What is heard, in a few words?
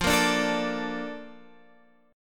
Bb Major 7th, F